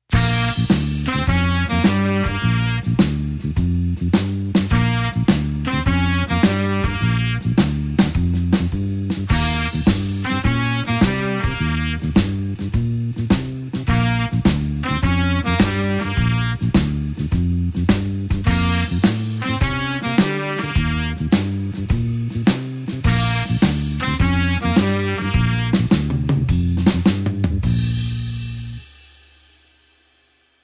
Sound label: blues horns shuffle Bb